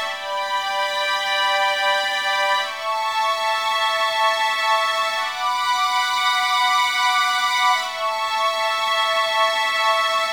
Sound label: strings, analog funk